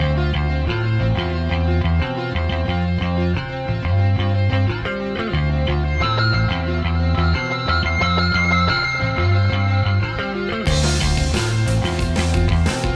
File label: alternative; sarmacja